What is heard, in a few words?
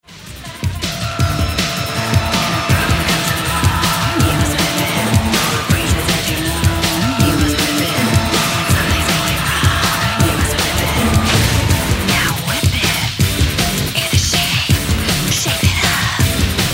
distort